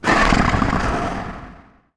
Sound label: AMBIENT; mahlendur